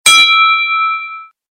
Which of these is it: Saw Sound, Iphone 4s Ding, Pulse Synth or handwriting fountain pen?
Iphone 4s Ding